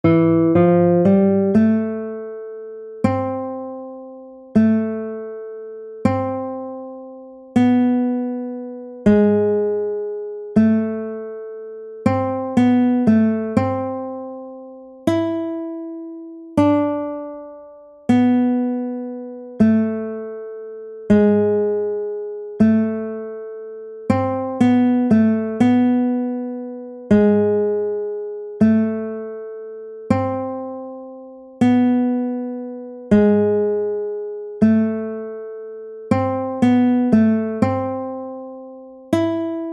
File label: prelude 3 duet for guitar